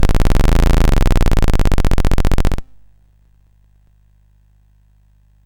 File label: CSG8580R5, GAMES; Street Rod